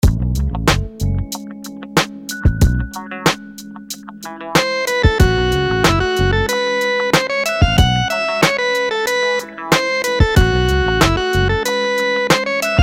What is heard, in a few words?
rhythms dotted 8s